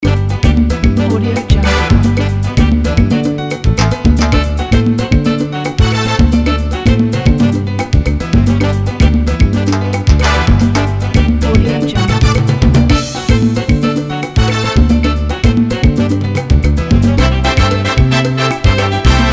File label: carnival